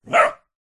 animals dog puppy several weeks old single bark ridgeback cross bullmastiff